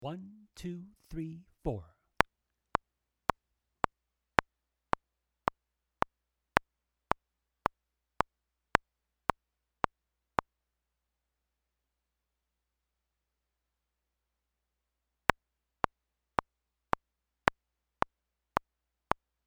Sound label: Eighth notes at 110 with gap click only